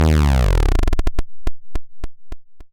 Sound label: lose